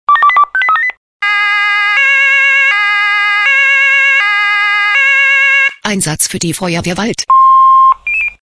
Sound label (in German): SMS Alarmierungstone; FW Wald